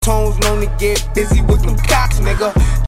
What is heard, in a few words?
glocks